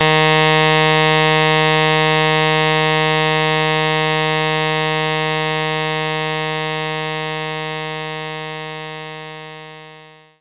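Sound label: Alto Sax Eb3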